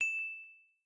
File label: Ding Sound Effect